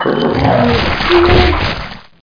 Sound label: die; tnpdemo